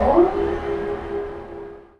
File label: Hemopump Working Start; rimworld